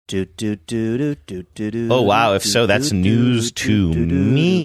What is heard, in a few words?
singing iconic portion